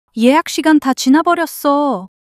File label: surprise